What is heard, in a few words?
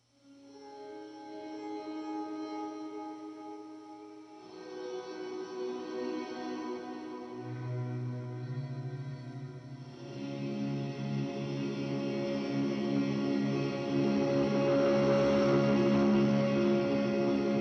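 square drone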